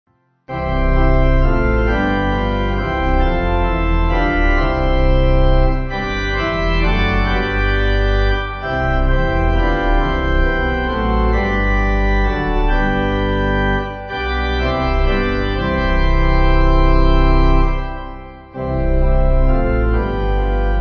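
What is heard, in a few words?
Pipe, hymn backing; Make Songs Of Joy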